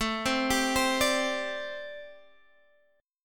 G Suspended 2nd Suspended 4th

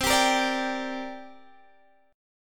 C 7th Suspended 2nd, Guitar, Half Step Down; C7sus2

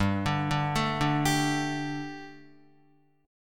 G Suspended 2nd G down Guitar; Gsus2 arpeggiated

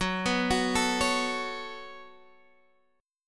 C sharp 7th Suspended 4th Sharp 5th, F sharp

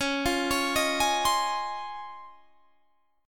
Db Major 9th Db down; arpeggiated; JGuitar

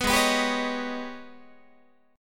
Ab Minor Major 11th, Guitar; strummed; JGuitar

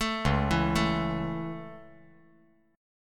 C sharp Minor Sharp 5th C sharp, chord; C#m#5 arpeggiated; JGuitar

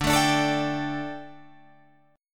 D Suspended 4th D, Guitar, Drop D

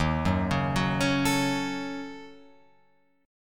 D sharp Minor 7th Flat 5th, Guitar, Open F; D#m7b5 arpeggiated; 3,1,1,1,4,4

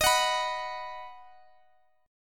D sharp Minor Sharp 5th D sharp, Guitar DADGAD; chordshape

